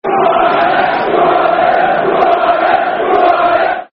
Who Are Ya [Football Chant (Soccer Song)], England